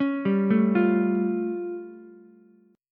A Augmented 7th A, Ukulele; arpeggiated; JGuitar

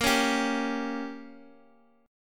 Bb Minor 6th, Guitar, Standard; strummed; chord shape; x,x,x,3,2,3

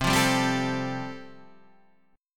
C 6th Suspended 4th C, chord; 6sus4:1,4,(5),6

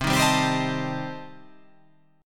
C Minor 6th C

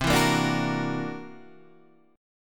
C 9th Flat 5th C down Guitar Open D

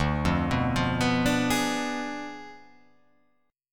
D Diminished 7th D

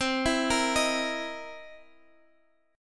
C 7th Sharp 9th, C

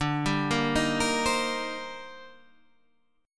D sharp Major 13th, D, guitar chord

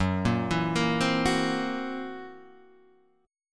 Gb 7th Flat 5th Gb Guitar Half Step Down

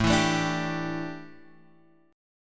A 7th Suspended 4th, down, chord; A7sus4 strummed

